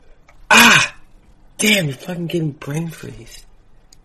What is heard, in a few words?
brain freeze